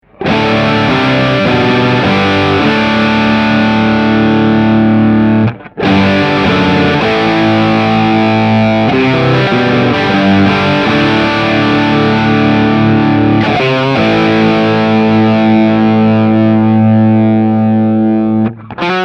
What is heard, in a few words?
Marshall rat